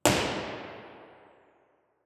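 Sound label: womans club impulse response; spokane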